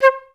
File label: FLS STAC C4; Best Service; Peter Siedlaczek's Advanced Orchestra